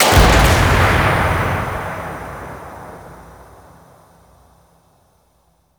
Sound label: Magician Tear In Space Ability; Warframe